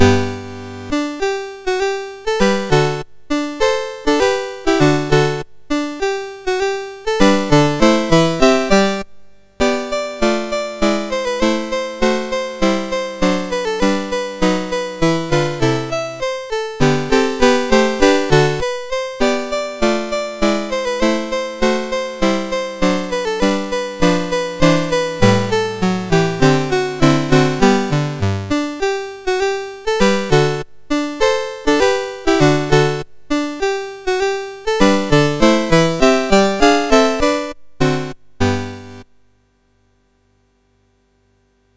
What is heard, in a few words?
Three Small Piano Pieces, MOS6581; MOS6581R4